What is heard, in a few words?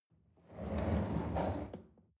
chair wheel roll on floor soft squeak